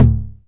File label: 909 HI TOM